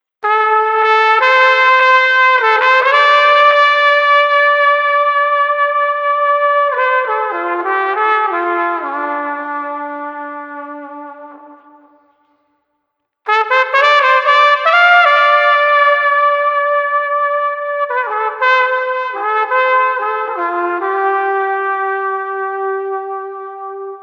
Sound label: Street Trumpet Heavy Heart 72